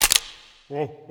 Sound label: defeat gun; smite; chiron